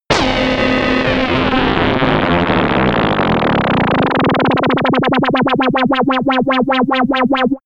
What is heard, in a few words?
oscillating energy loss; pulsieren